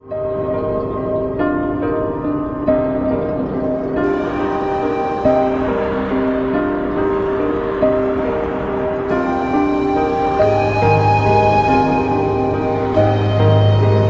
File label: melancholy